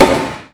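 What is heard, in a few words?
axe impact metal; insurrectionist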